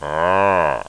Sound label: ahhh